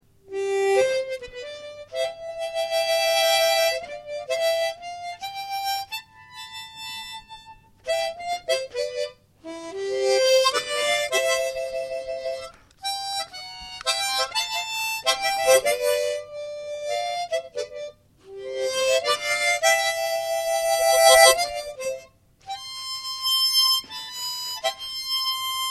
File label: harmonica, two tunes; ashington folk club